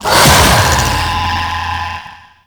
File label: Triangle Arm Destroyed; Warframe; ManInTheWall